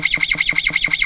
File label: Fret Noise